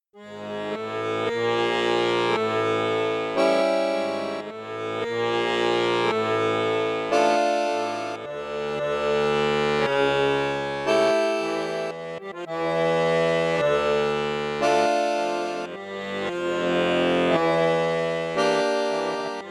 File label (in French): registre 16 8 boite 4, bayan; borsini bayan